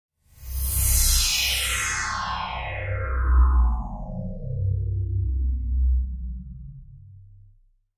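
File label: Wash Mystical HF Elect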